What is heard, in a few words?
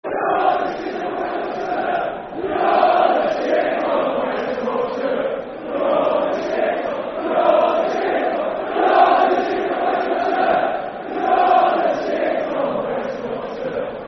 West Yorkshire Rivals Football Chant (Soccer Song); Bradford City Bcfc